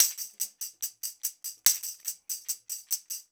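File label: TAMB, tambourine; Drumdrops In Dub VOL-1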